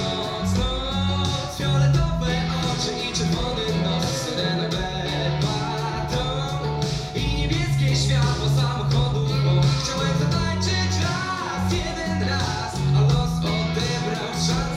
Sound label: rock